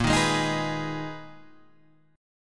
Ab Minor Bb, Abm/Bb chord; Abm/Bb strummed; JGuitar

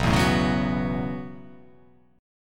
B 7th Suspended 2nd Suspended 4th B down

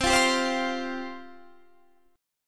C Suspended 4th C

